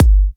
G, Kicks; markus hakala

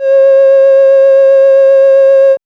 MOOG C 4; AMG - Vince Clarke Lucky Bastard

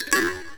trick, signals; AKAI; FX SIGNALS

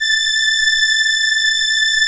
ARP STR ENSL A4, First Call Vintage Keyboards

